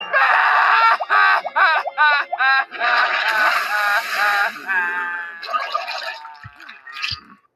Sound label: ape crying